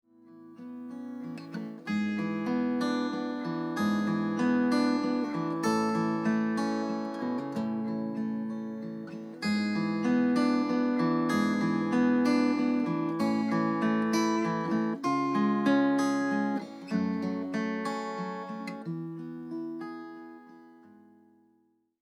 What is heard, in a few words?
larmes guit